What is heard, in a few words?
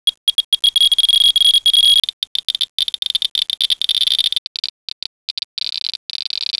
Click, geiger counters